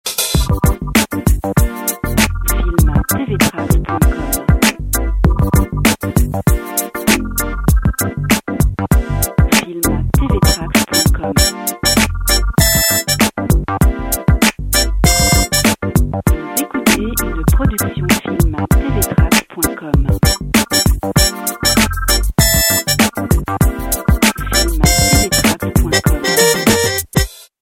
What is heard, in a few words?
Rocky Funky; Filmtv-tracks